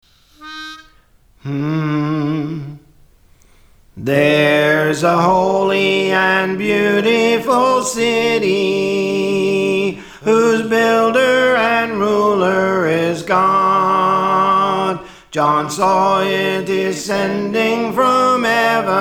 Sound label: Soprano; Theres a Holy and Beautiful City; Praise For The Lord Training